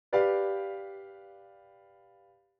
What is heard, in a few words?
dominant motion